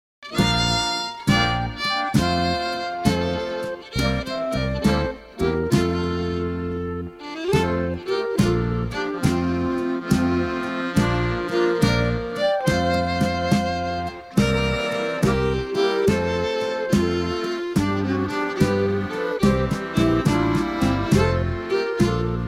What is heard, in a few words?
oxford minuet instrumental